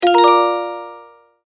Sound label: multimedia tone start